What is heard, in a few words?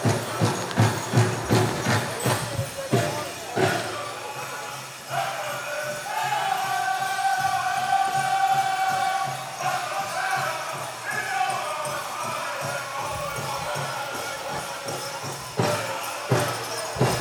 PowWow Song MC; Menominee PowWow 4 Aug 2023